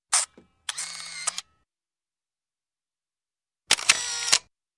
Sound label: Camera 35mm Automatic 1 Shot, auto Advance; Photography; Sound Ideas 2000 Series